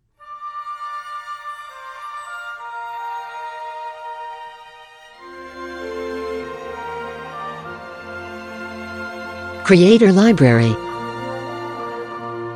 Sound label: Sweeping Romantic Orchestral Theme, Cinematic